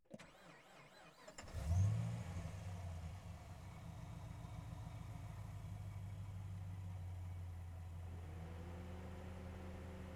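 Camaro ZL1 Onboard Start Idle Blips Steady in Neutral Off Engine Intake; 2014; DPA4062; Pole Position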